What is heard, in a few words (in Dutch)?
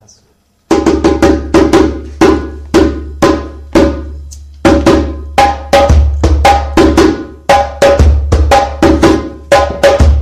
Sunu ritmes